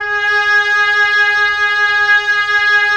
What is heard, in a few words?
WND OB F, Oboe f; Roland - LA Composer vol. 4